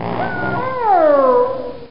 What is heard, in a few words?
child die; tripodhopper